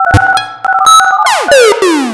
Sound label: Glitch; lolicore